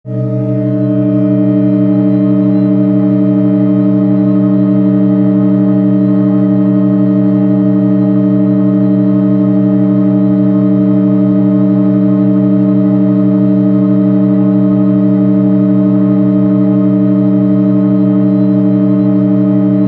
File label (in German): cage siebener akkord nahaufnahme a gis